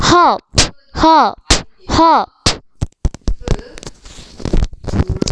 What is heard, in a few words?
harp